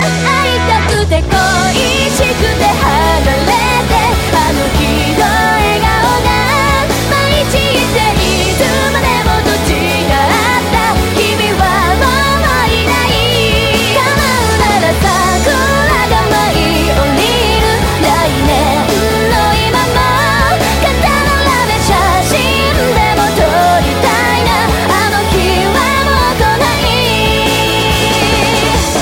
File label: Rock